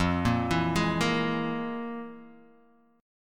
F Minor Major 7th Double Flat 5th, F, Guitar, Half Step Down